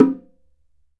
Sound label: Bongo Low; EMU PX-7